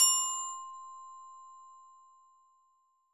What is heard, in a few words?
glockenspiel chord C7